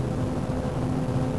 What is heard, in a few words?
Motor, Train; OpenBVE